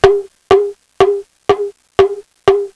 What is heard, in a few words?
Hi Tom Drum; Music; Soundsation